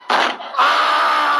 crunch aaaah

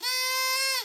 blowing horn; poob

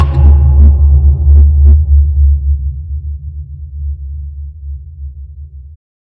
low boing

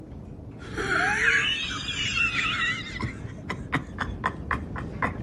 laugh; william dafoe

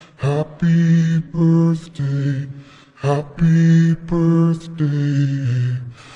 happy birthday happy birthday a a

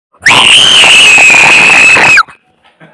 aaaaaaaaagh

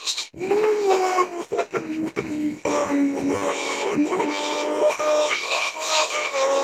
crying male; fnaf

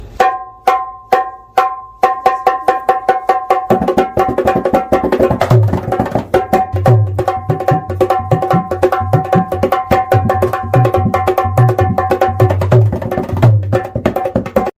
dholki

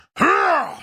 guard grunt; oblivion

